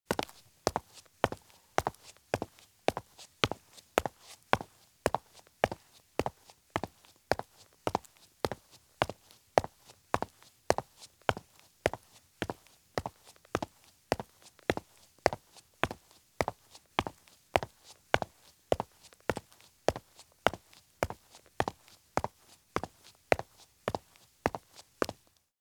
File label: Medium Running Shoes Walk at Very Fast Pace on Concrete; Sound Ideas; Footstep Sound Effects